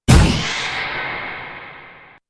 Hurricane Thrust; Dota 2; Hurricane Pike

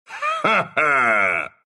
laugh, ru; dota2

